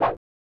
SFX Swipe

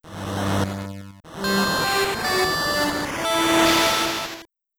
Appearance Fanfare; Metroid in the Action; Return of Samus

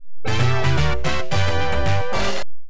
Unknown Fanfare; Hurricane; Williams; pinball; arcade

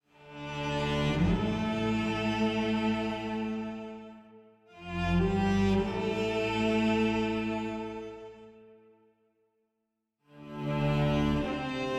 cello all; fatebinder; Tyranny Gold Edition

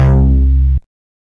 Retry Jingle; Friday Night Funkin Noobtown ost mod windows gamerip 2023

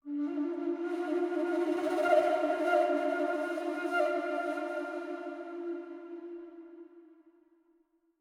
flute

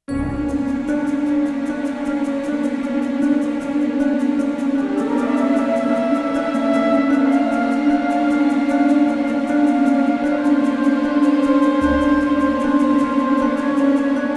suspence short; Chrome

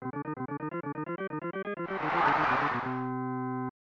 Opponent Touchdown

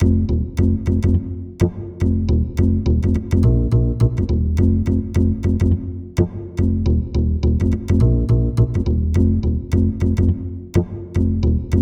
Bass Loop; Test Bass B I G Loop

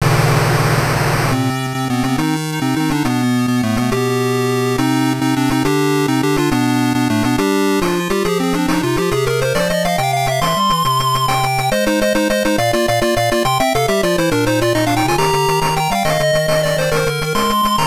Defeat; head buster; game gear

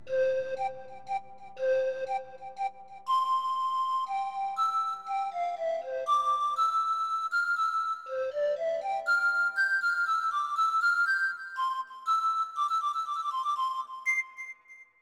Pokemon Flute; Pokemon Snap

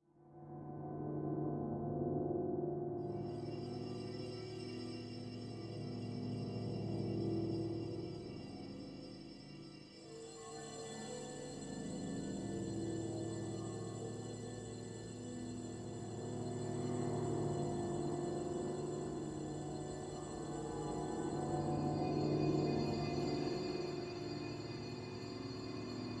Ambiance; Experience112; Mus Tyriad Ambiance

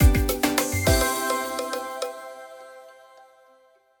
win stinger; Wish

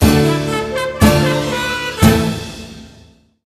Swordplay - Showdown - Transition Fanfare; Wii Sports Resort

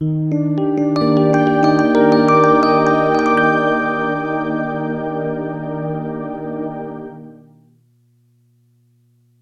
Jingle, Wii U Chat Disconnected

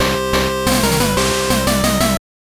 Jingle, soundtrack; Koi wa Kakehiki; GB

